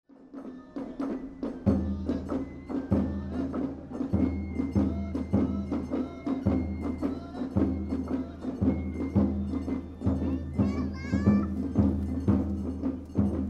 druming; ekimae

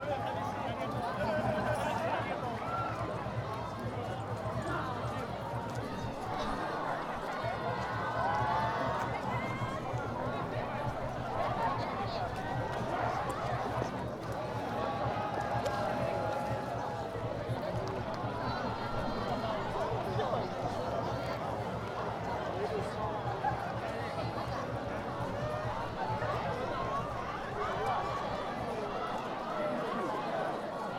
grad

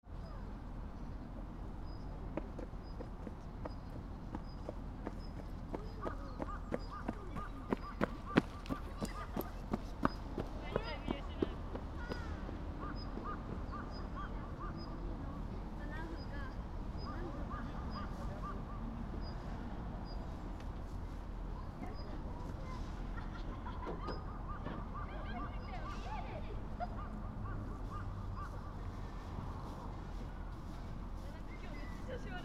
Fukushima Soundscape: Shinhama Park; Mt. Shinobu Park; On a cold Saturday afternoon, many children were playing at the park.; A crow was cawing ceaselessly; Koji Nagahata

